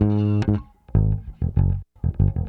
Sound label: Bass, 70s Analog Funk